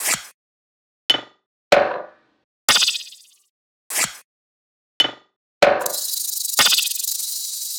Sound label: perc 123; Drum Sample Pack; Loops; SD SEISMIC; Slate